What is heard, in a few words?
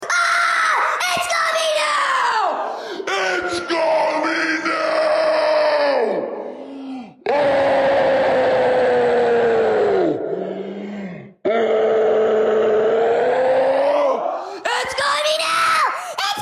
goofy sound